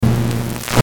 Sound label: Electrical Power Surge; Electrical Sound Effects